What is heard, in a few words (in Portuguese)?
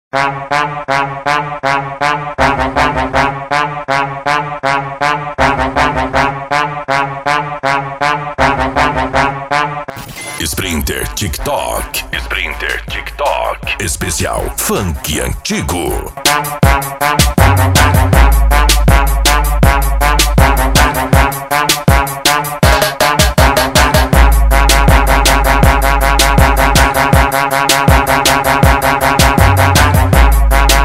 esp funk antigo